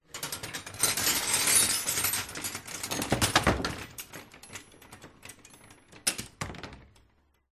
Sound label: shaking some wrenches metallic movements clinks and clunks; Sound Ex Machina